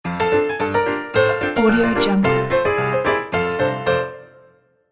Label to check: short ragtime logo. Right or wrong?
Right